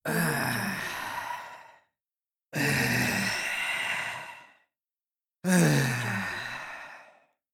male heavy exhale

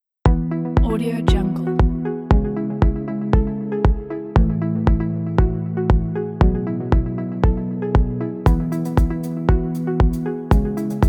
bright idea

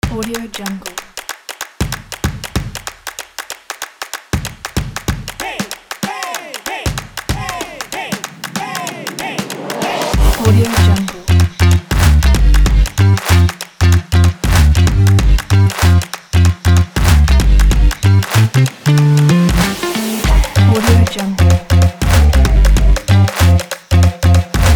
upbeat percussion rock

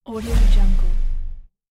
swoosh

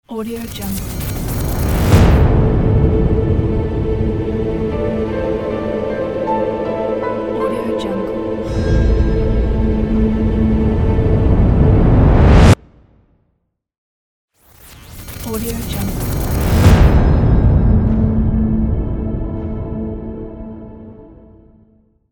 epic logo

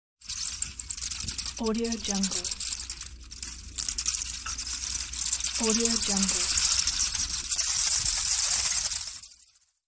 bat cave

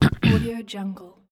man clear throat

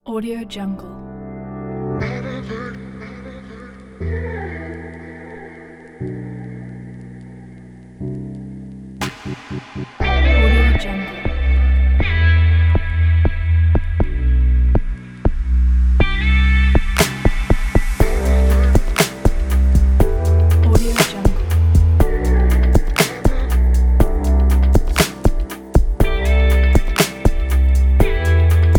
chill triphop

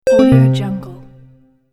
considerate synth hide

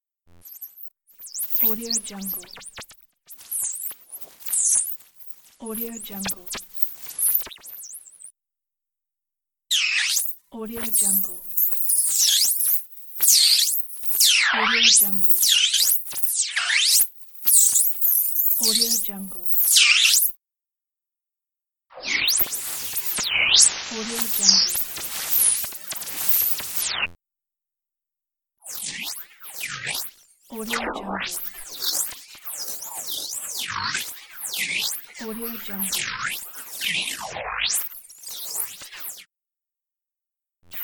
signal interference

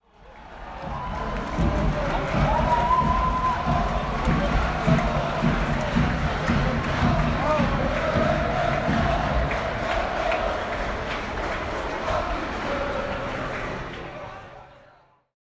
large crowd cheering ambience

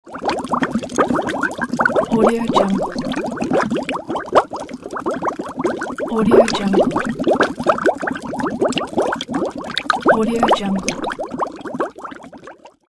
liquid bubbling; debol757

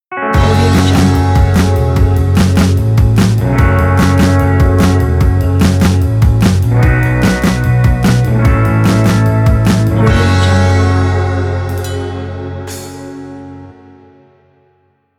surf rock opener ident; aftermoonrecords

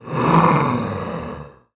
frankenstein growl

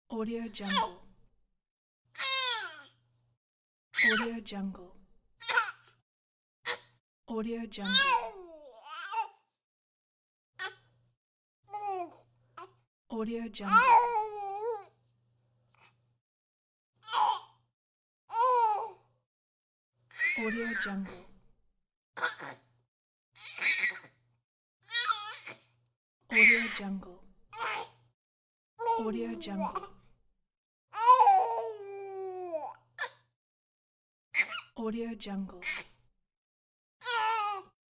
baby sounds pack

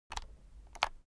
foley office keyboard external shortcut long; smartsoundfx

